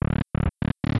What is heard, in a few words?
door opens